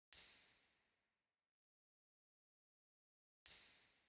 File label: Musikverein, Theatres